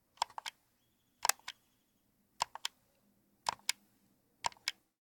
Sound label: game controller buttons; sonniss gdc